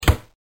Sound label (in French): Fermeture Placard